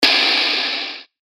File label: Noise, percussion; revealed percussion vol 3